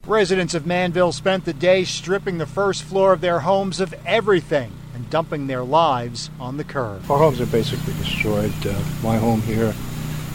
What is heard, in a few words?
manville explosion